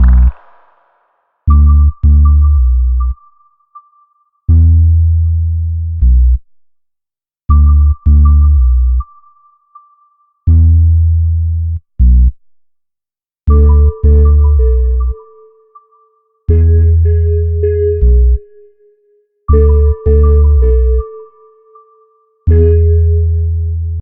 stem outro; Pix the Cat

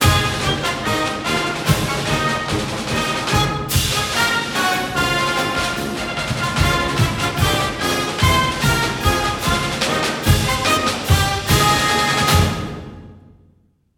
Fight Song; NCAA March Madness 08; PS2, PS3, Xbox 360

